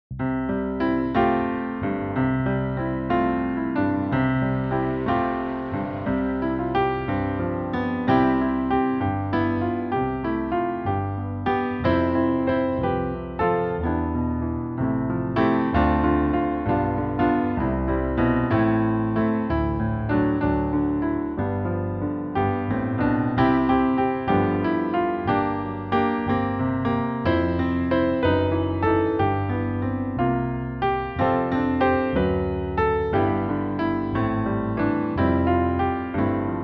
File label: Jingle Bells We Wish You a Merry Christmas, christmas piano